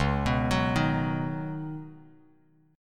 C sharp 9th Suspended 4th C sharp down Bass Standard, chord; C#9sus4 arpeggiated; JGuitar